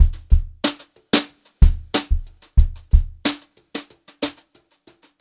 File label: Brushed Drum Loops; USB Soundscan vol.39; AKAI; 90 s Sample CDs